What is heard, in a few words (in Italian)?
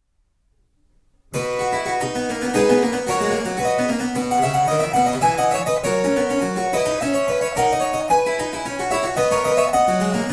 Var Italiano, harpsichords